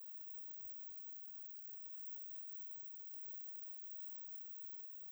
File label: single pickup, Gas Leak; crysound; Handheld Acoustic Imager